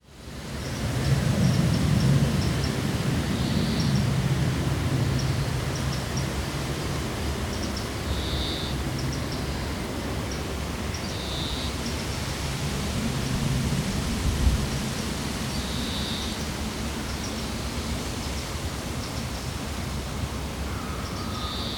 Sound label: London park; field recordings